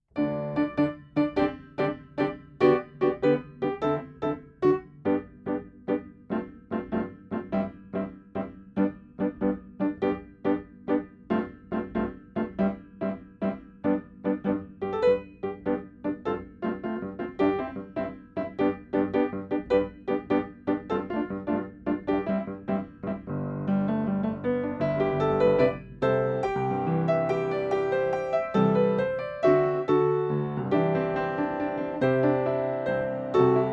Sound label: Reduction Accomp; Shepherds' Tale